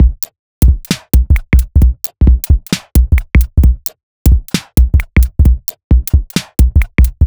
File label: Bpm132 Eraser Break, dubstep drum loops